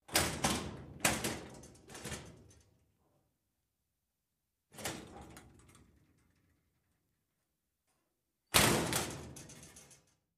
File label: Locker Slam Mediu